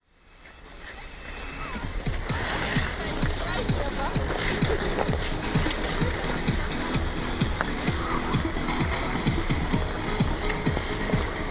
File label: skating rink; sergiyev posad